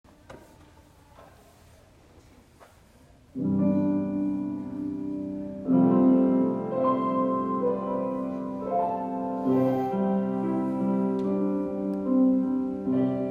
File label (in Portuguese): Bencao Baixo, coros; encontro sinodal de coros do sinodo rio dos sinos